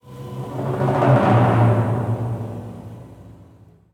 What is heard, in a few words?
Steps Sequence Musical Stinger